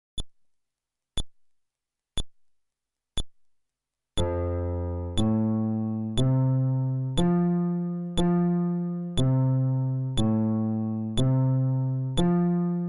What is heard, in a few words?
Arpeggio, fretless bass